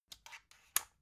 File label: magnetic plug soft, elektronik; objekte